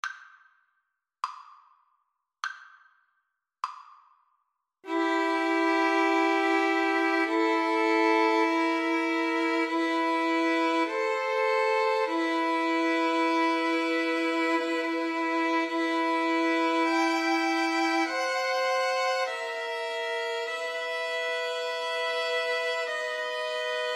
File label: aequale VLN TRIO